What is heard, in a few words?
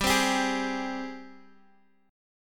G sharp Major 7th G